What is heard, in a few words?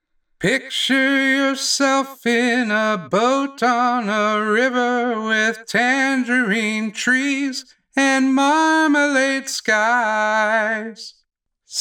singing waiter